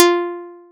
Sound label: plucked, programmable speaker